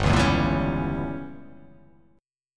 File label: G Diminished 7th